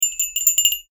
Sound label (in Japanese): 熊よけ鈴